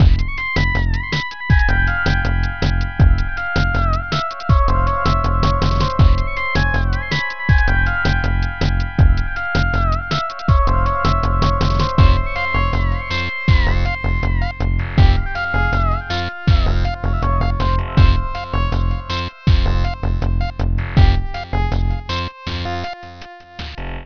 keygen; BLiZZARD; craxnhax

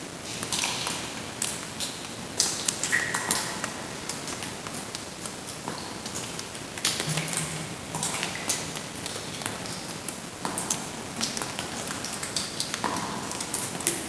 water drips loop

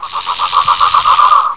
pit worm idle

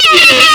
claw strike

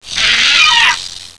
pit worm attack swipe

